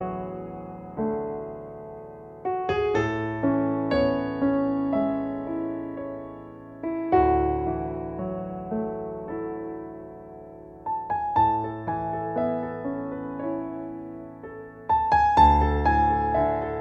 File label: improvisation